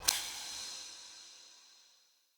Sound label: UI Tool Tip; Hud UI